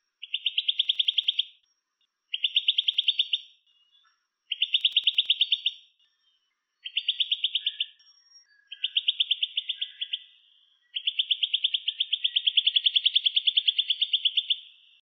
suara cucak kelabu

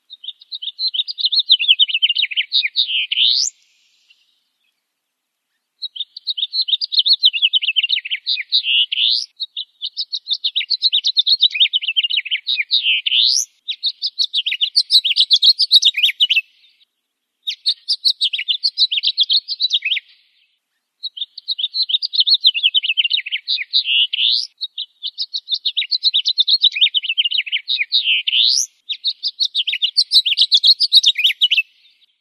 suara house finch

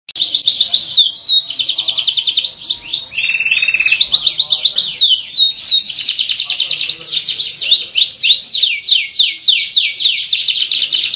kenari gambate jeda

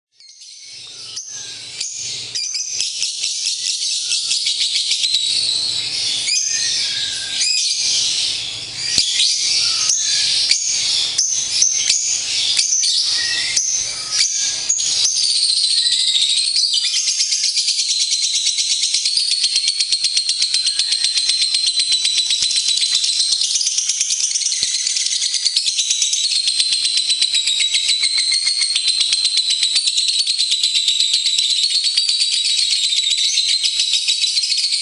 audio lovebird ngekek panjang

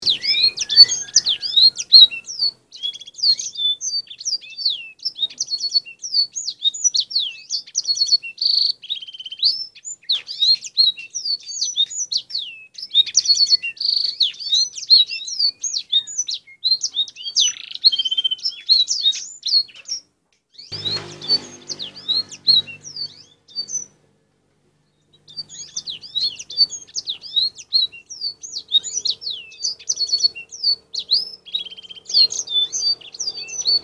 kenari isian blackthroat

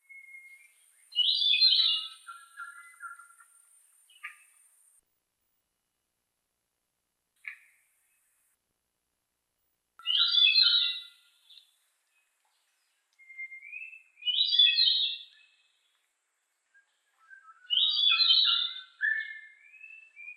cucak rumbai tungging

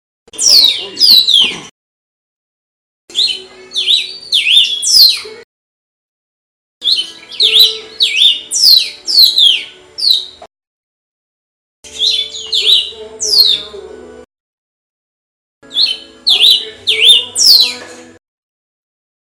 burung kardinal

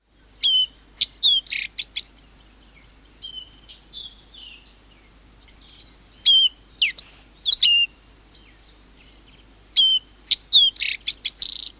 emberiza muka hitam

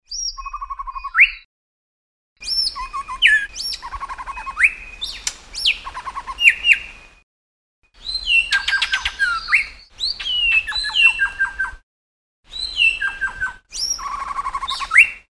suara anis bentet kelabu

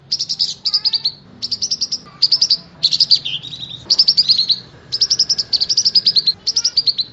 kapas tembak isian love bird

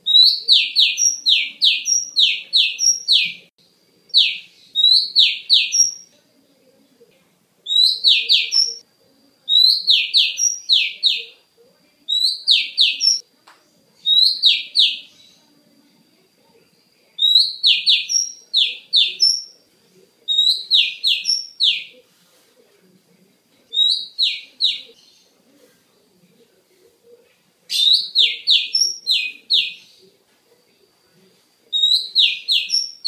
kacer betina

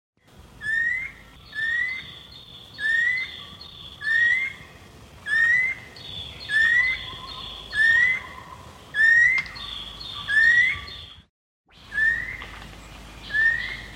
cekakak hutan melayu

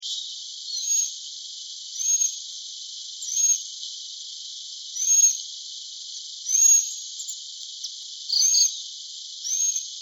suara burung sepah kecil di tembalang

